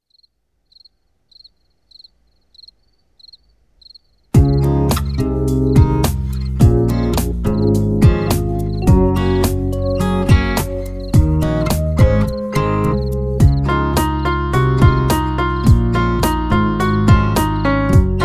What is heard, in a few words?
spring rain piano